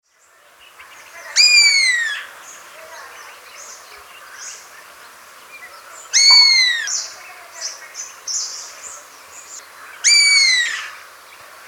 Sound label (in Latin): pitta brachyura, ptaki; kurtaczek bengalski